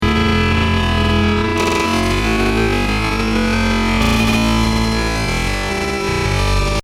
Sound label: solo modulation, edge delay